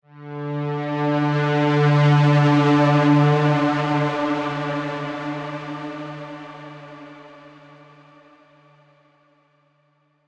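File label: Lo-Tech 110 one shot pad D minor, Degraded Tape; Used Bin Loops; Lo-Tech Premium Degraded Tape FX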